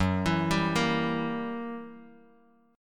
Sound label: Gb 7th Flat 9th, Bass Standard; arpeggiated; JGuitar